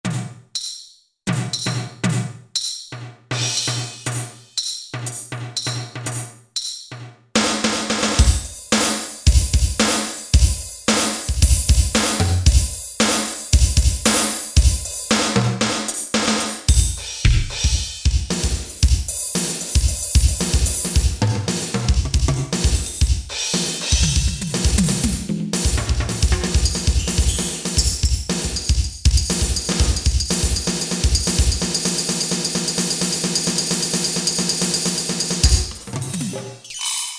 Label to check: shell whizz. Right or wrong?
Wrong